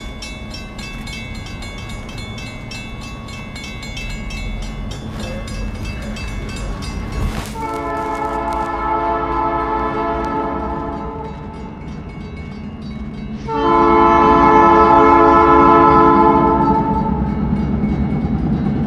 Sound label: crossing horn